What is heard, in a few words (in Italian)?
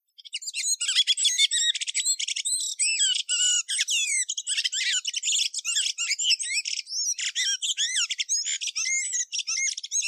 Tordo sassello